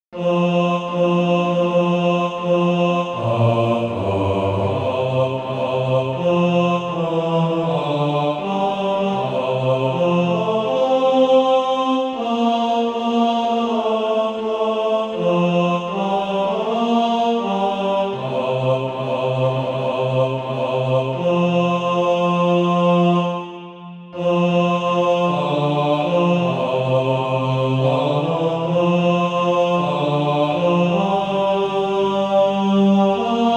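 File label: bass; Hall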